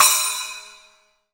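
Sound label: splash, Crash Cymbal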